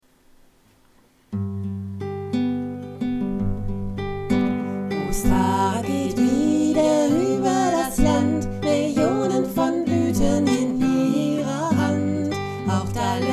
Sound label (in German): 2 stimmig